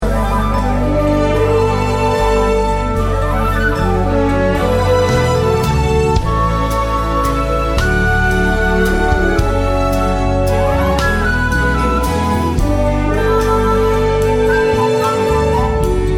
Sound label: Pops Orchestra